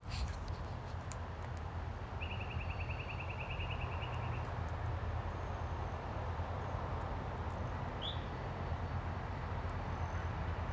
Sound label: yellow breasted chat